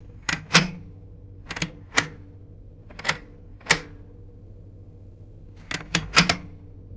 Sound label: heavy vintage ship telephone receiver pick up; boats